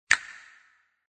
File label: switch